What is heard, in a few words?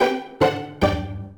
Jingle - Lesson Unlocked; Rhythm 'n Notes; DS